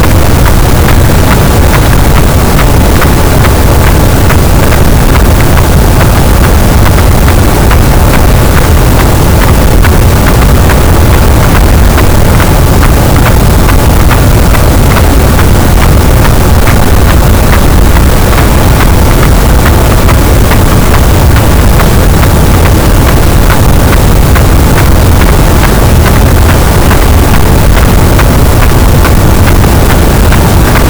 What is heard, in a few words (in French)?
cachalot, Physeter Macrocephalus, gaussian noise